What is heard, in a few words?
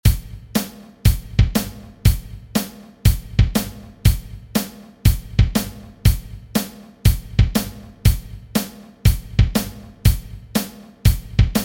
shuffle